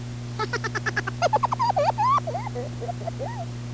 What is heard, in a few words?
laugh; 2-XL Laugh #1